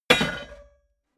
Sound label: hammer hit metal